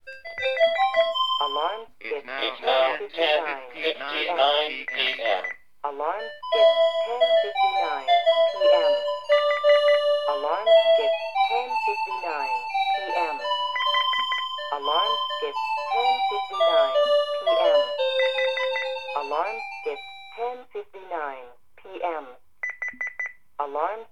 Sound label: clocks